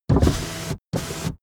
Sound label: car window front open unsteady